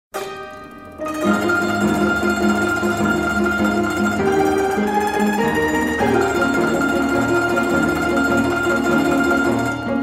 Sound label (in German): strassenklavier